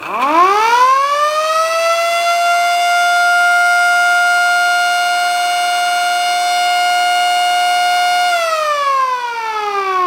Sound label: Motor Siren